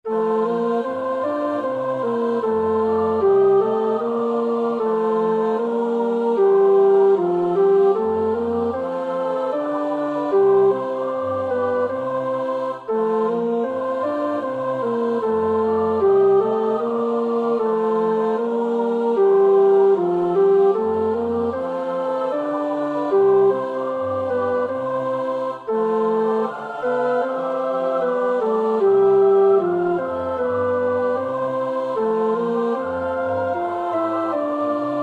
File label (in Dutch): tenor, koorpartij; Bach (Johann Sebastiaan)